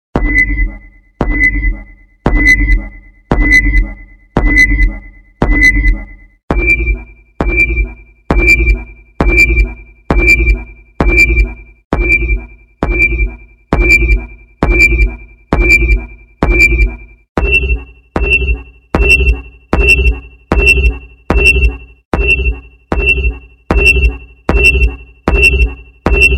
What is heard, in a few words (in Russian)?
zvuk poiskovogo radara